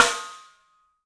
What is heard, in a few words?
MARCH, 3D Drums; 3D Sound; MARCH XTREM